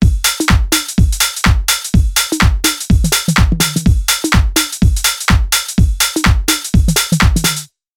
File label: Geometric 125bpm